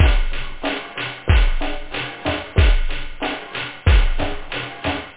bongo; da capo vol1; instruments; techno

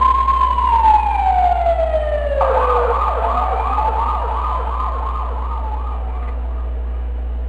Police; Macnopoly 2.3 Lite

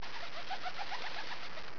pigeons; 10,000 Graphics Pack Volume 1; Media Graphics International

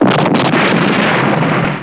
explod; Total Chaos Nukem 3d Pack; duke

